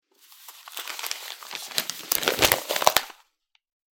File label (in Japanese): 新聞紙を広げる音